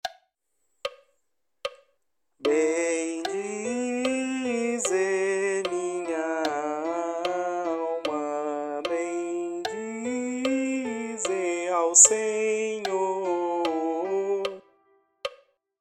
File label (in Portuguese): Salmo coro